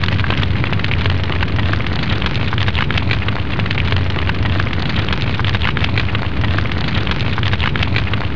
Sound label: Fire; FSR 2010